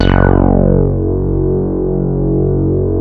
SH 1000, BASSES

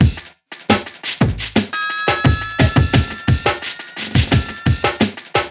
Drum n Jungle

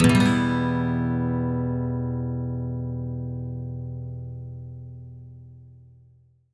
MIN SWEEP E, Electric & Acoustic Guitar Loops; SWEEPS; USB Soundscan vol.04; AKAI

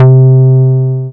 Dance Floor Bass, Multisample Basses; Best Service

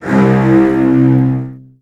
C1, Virtuoso Strings, 16TH; ILIO; Kirk Hunter; CL 16TH

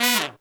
Pop & Funk Brass, TRP TEN FX; Best Service ProSamples vol.25; AKAI

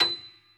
piano stereo B 6; AKAI S6000 CD-ROM - Volume 1

